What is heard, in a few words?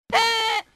laugh